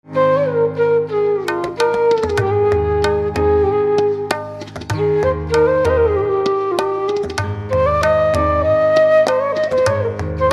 Flute with Tabla; instrumental ringtones

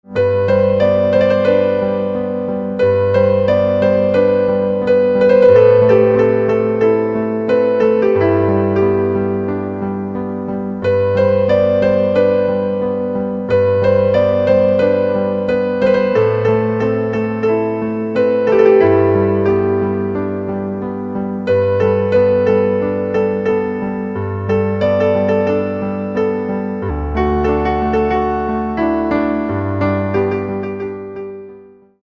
Piano

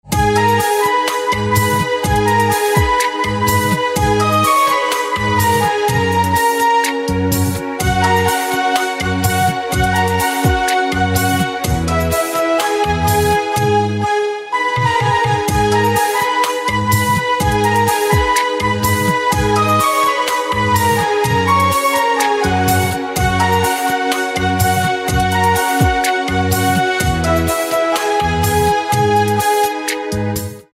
jalatarang synth; instrumental ringtones

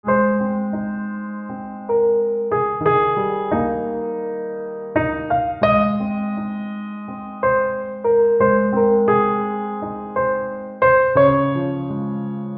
Piano Silent; instrumental ringtones

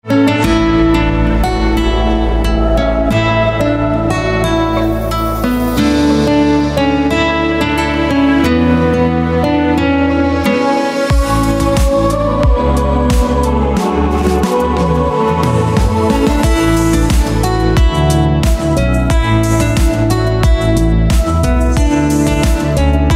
Harp

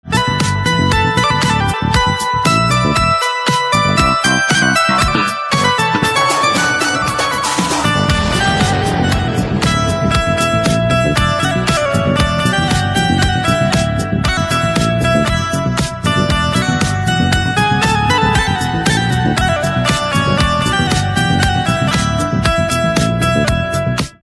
Banjo

